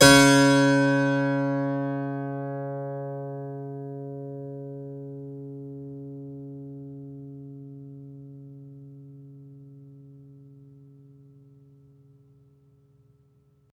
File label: C#4, Synclavier keys and guitars; Sampler Library